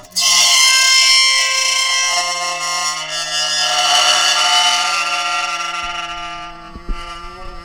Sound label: ronk, science fiction; E-MU Producer Series Vol. 3 – Hollywood Sound Effects